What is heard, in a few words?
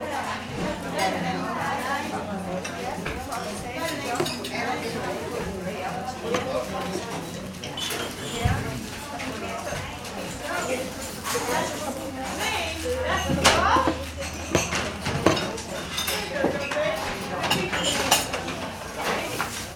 ambience restaurant cafe england small busy; sound effects one